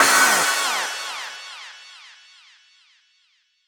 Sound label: dubstep club stab